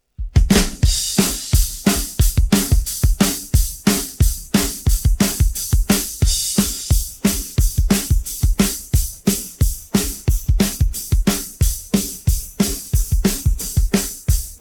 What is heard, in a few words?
drums pattern